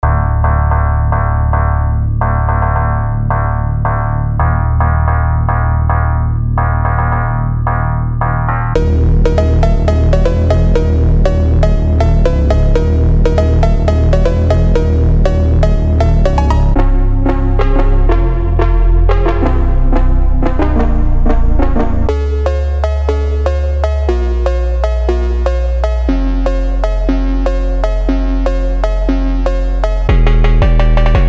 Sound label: drift phonk; serum 2 preset bank; kvass lab